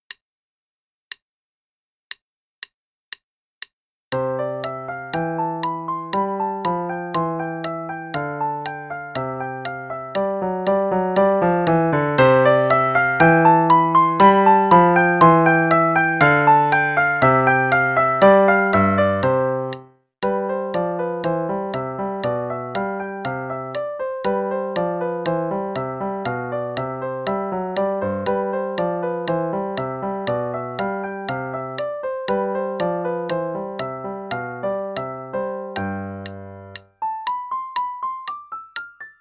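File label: Play-a-long, Sonatina in C Major, quarter note=120; Latour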